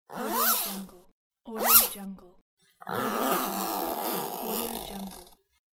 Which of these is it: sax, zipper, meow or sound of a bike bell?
zipper